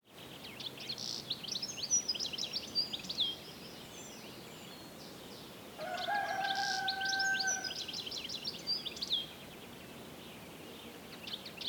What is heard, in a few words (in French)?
aube vezelienne